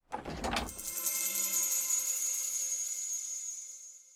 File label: chest Open